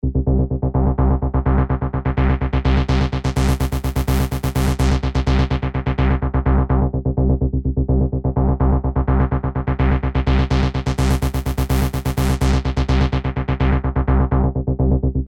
Synthbass Sequence; Apple GarageBand 2; Apple Loops

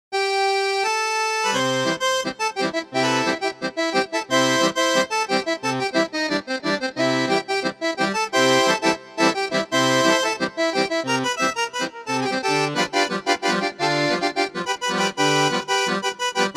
Fisa Americana

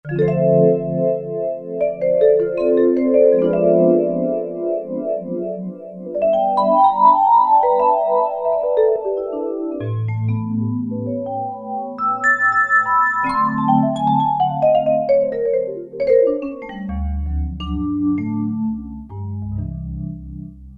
Real Vibes; Factory Presets

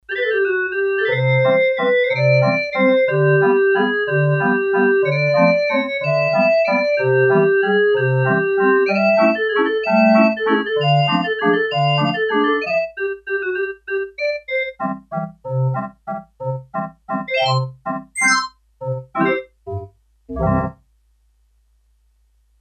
Organ, Korg DS-8; Factory Patches

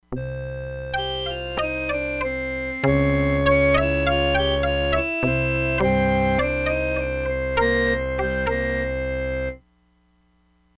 SLAP WHISTLE, Crumar Bit 99; Factory Programs

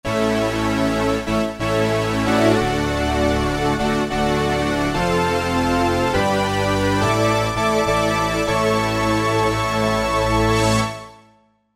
Poly, vintage synth; Roland SR-JV80-04

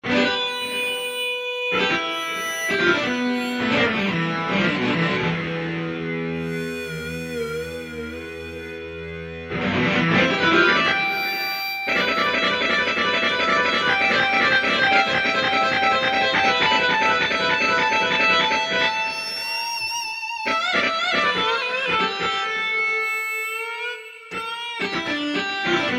Rock Axe; Kurzweil K2000 Contemporary ROM

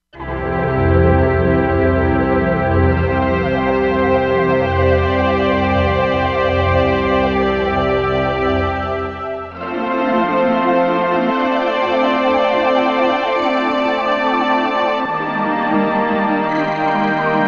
Pad & Str, Korg MSC-16S; Environment

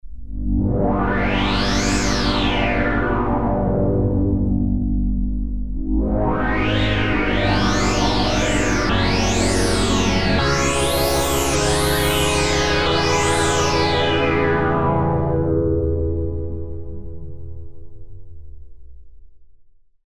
Grunge, Korg Poly-61M

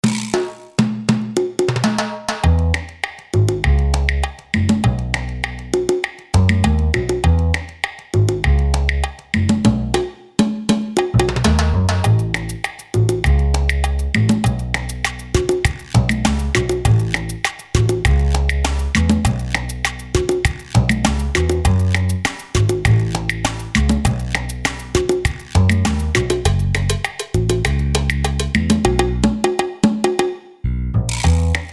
SALSA, Boss DR-3

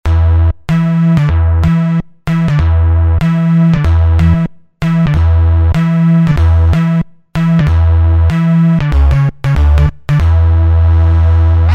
Alfa-D Bass, Roland V-Synth; Factory Patches

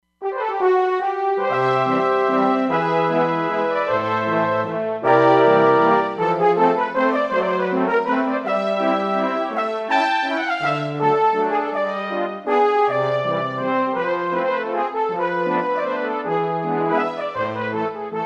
Orch & Horns, Roland Fantom XR

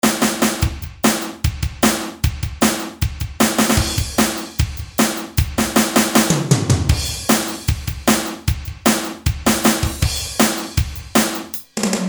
R&R Kit; Roland L-CDX-01 Rhythm Section Instruments

